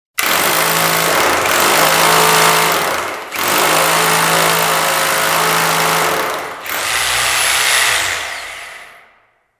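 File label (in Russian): звук перфоратора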